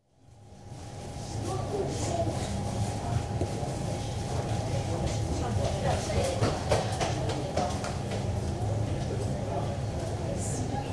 Car Ferry, atmosphere in rest lounge in public area, with childrens' voices; Boat; Car Ferry St Nicholas, 17,400 tons, built 1980; BBC